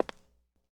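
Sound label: Foot Concrete Shoes Walk; Lucius III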